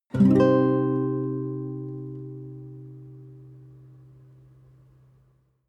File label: nylon guitar chord full minor soft